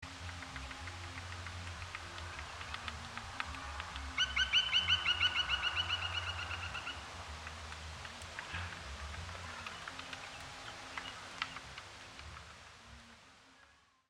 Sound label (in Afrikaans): Chloropicus namaquus, kap en roep; Waterberg Natuurpraal